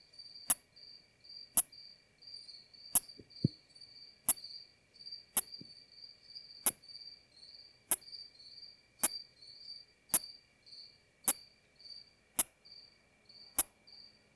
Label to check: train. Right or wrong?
Wrong